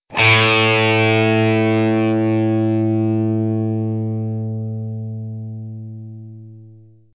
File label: gibson stereo